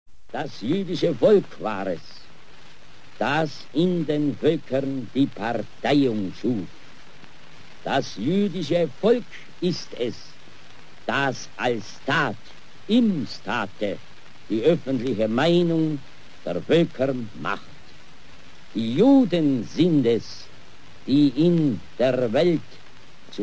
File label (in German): streicher